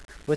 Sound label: pig says